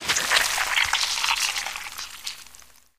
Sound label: blood spurt, splat; Left 4 Dead 2